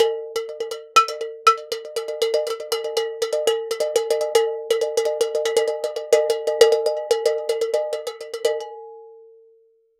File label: cowbell drum